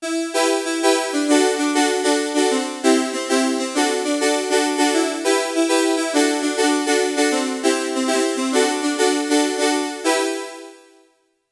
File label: chord progression, reface cs; yamaha